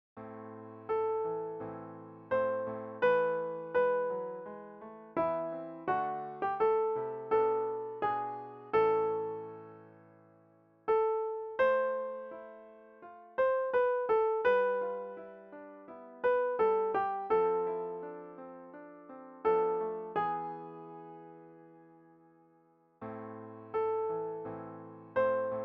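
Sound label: Soprano, MIDI; Lord, Make Me to Know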